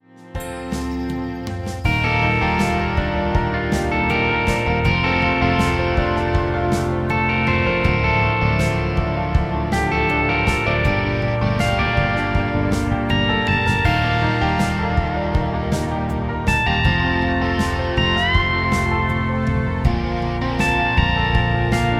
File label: Wave, RINGTONE; MIUI14 and HyperOS 1.0